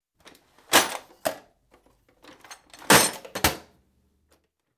Tool Box Closed; Construction; BBC Sound Effects Library

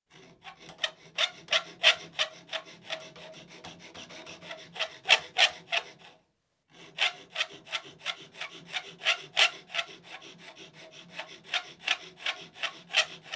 File, Formica (With Squealing On; Construction; BBC Sound Effects Library

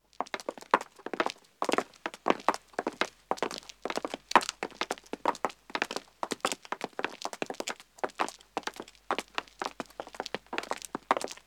Pavement Walking 2 men & 2 wo, Footsteps; The BBC Sound Effects Library

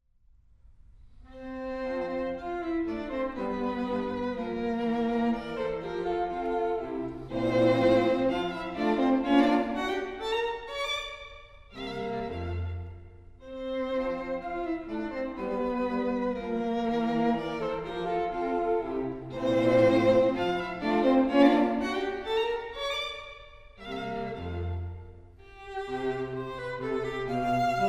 Minuet in F for String Quartet; Quintets Quartets; Mozart; St Martin in the Field's Chamber Ensemble

